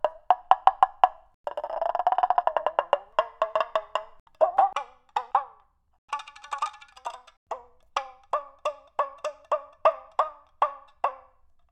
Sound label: Nylon String